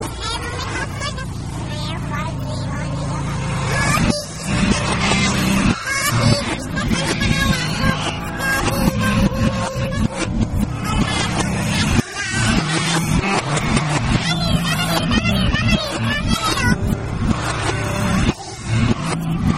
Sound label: puke